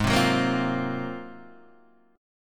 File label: Ab 13th Ab Guitar Half Step Down